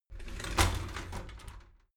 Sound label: shower slide door inside open regular halfway